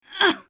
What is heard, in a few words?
Wall Climb Grunt; Lara; Playable Tutorial Level